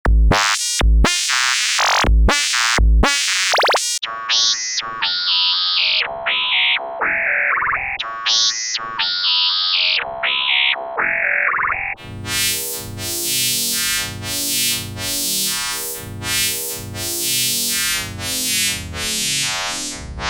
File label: Zap Loop; Hologram